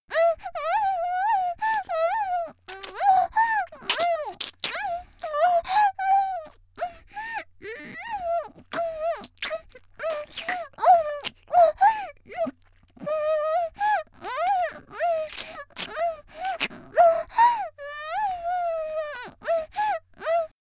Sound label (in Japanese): 「喘ぎ」口にも入れられくぐもった状態（嬉しそう）, 少女; 元気な少女